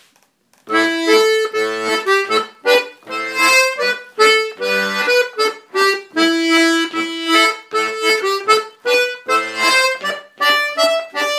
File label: Polka; Kerrys